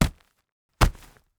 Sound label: Horror Body Stomp